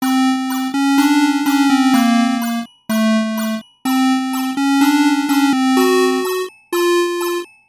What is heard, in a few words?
anxiety spooky